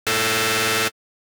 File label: Wavetable Saw PHASE MOD; Mod Osc; Pigments